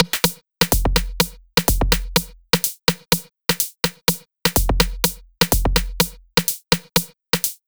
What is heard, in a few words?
Housed Beat I